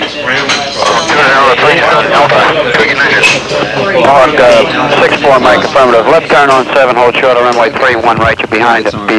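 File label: air traffic; Transportation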